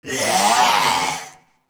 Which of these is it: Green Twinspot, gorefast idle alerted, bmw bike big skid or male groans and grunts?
gorefast idle alerted